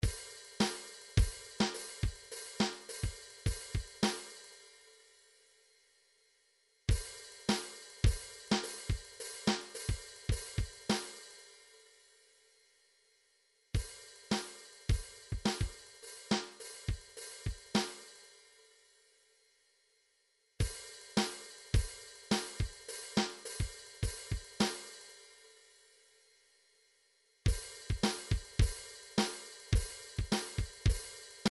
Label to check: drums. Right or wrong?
Right